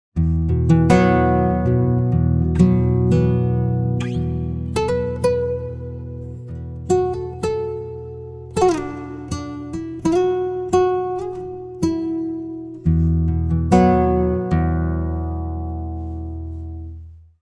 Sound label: pitchshift, guitar; digidesign pro tools xform